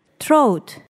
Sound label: throat